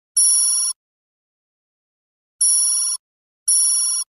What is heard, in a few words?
information read signal